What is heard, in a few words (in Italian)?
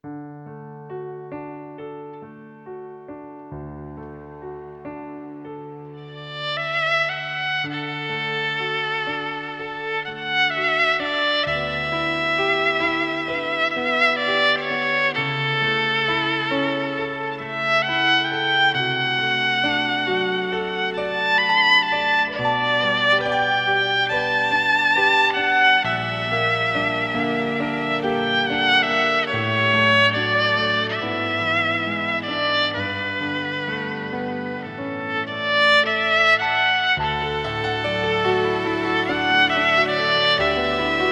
violino piano